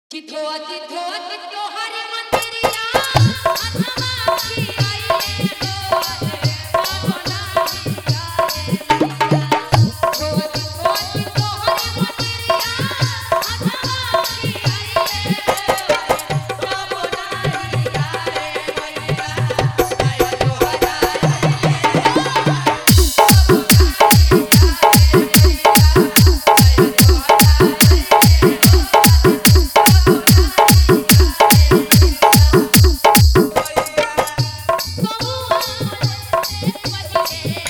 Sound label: Jagran Bhakti Dj Remix Song